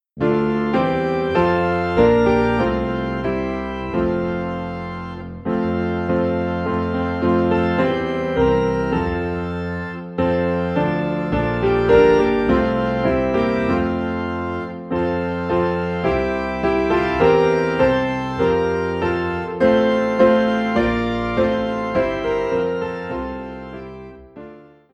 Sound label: Piano and Organ High in D; Take My Life and Let It Be